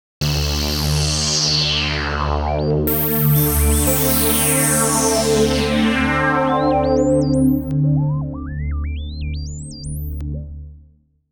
ufo

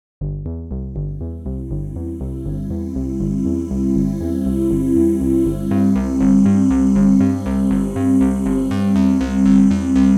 moon arp

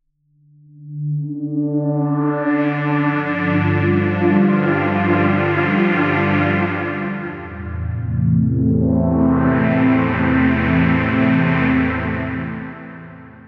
panned

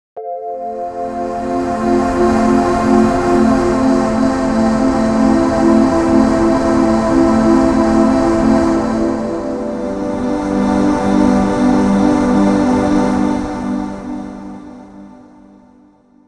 noisebox

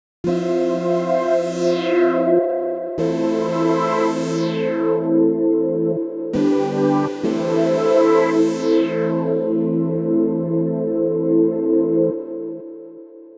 bees

